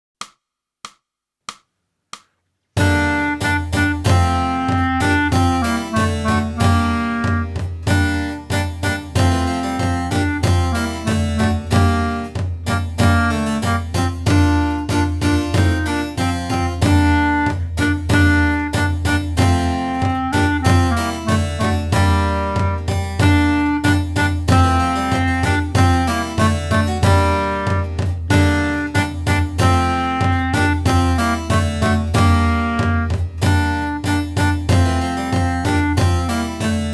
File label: clarinet; boosey method